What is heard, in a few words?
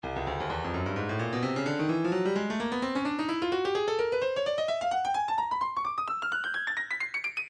breakbeat 129 bpm; Stylus Breakbeat Midi Files 125 129 Bpm; Park Jam